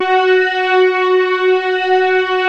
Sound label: PAD, Vintage Voltage